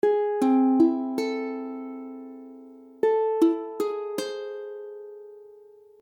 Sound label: Ammaj7, akordy ukulele